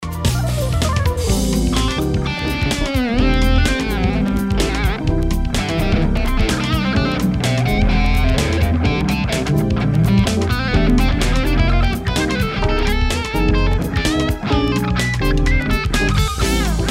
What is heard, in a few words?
guitar test